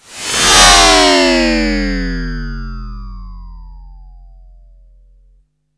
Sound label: FLYBY, SYN. FLYBYS